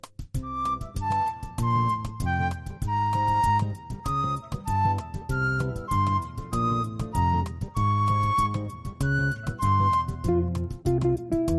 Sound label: Groove Samba